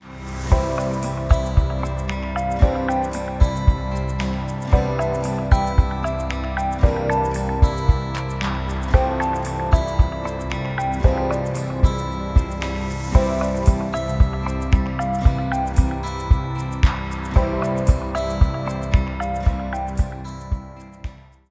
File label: Instrumental